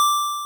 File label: synth D7; osu